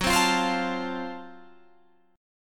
Gb Minor Major 7th Flat 5th, Guitar, Drop D, chord